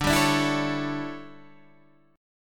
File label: D Major 7th Suspended 2nd Suspended 4th, chord, Guitar Standard; DM7sus2sus4; strummed; JGuitar